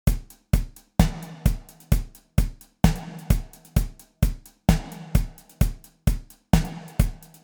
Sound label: beat